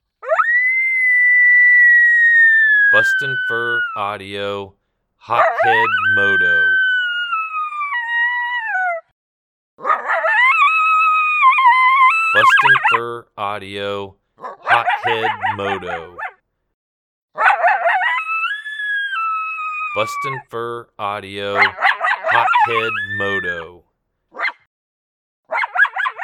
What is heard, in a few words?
Juvenile male Coyote howling with aggression; Really makes Coyotes mad; BFA Hothead Moto